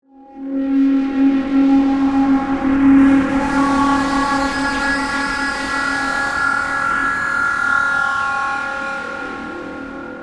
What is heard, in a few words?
Distortion Swell; Eerie; BlastWave